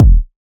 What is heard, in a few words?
kick big